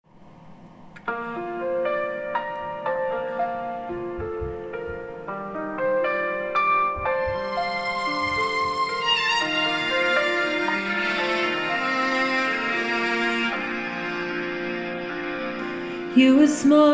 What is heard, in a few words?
Vocal; Knave; VOCAL GUIDE